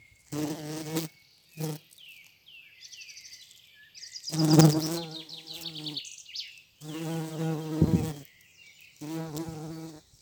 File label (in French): Bourdon jaune; Vols Bourdn abeilles